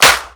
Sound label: clap; drums